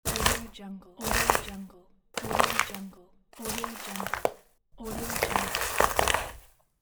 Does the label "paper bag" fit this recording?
Yes